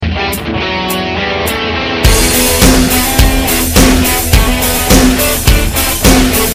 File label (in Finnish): Riffi